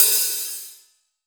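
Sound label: ROCK open hi-hat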